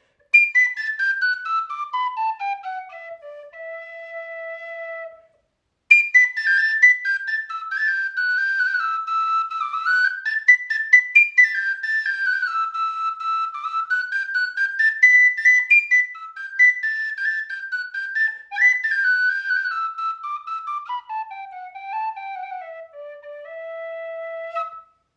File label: Tin Wistle